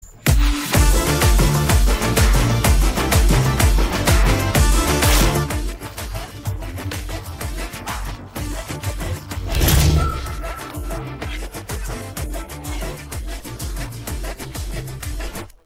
Bgm; Stree 2 Teaser

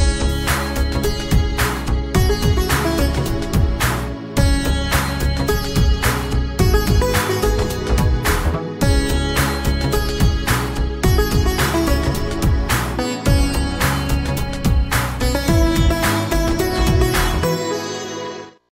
Sitar, BGM; Mr Bachchan

